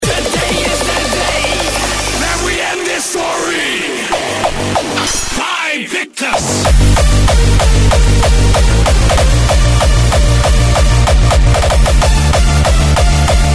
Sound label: frenchcore